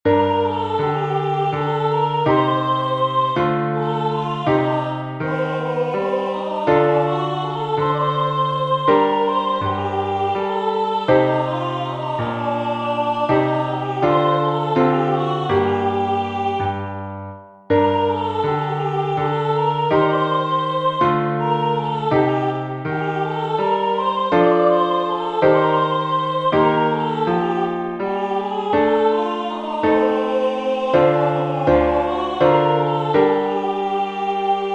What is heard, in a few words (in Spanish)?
entonacion con piano; Entoación con acompañamento